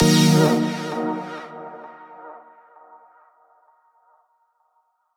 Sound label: Stab; neuro; InsideInfo